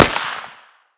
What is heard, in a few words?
fire suppressed, weapons; cw fiveseven